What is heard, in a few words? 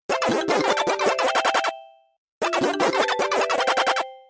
Pacman Waka Waka Sony Ericsson